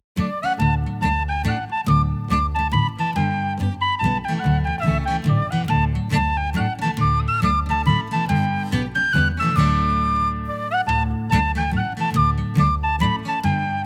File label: mazurka